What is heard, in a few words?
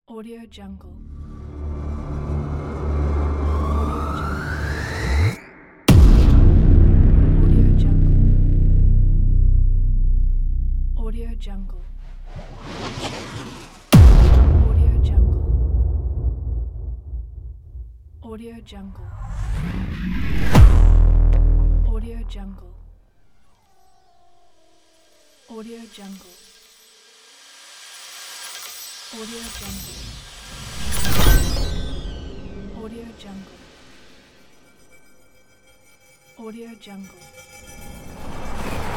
cinematic trailer sound pack